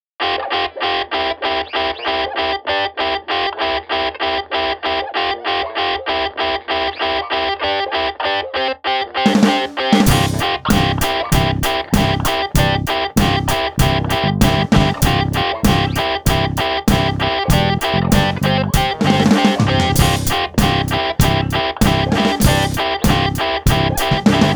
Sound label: 70s rock intro